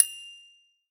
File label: deskbell; Scrat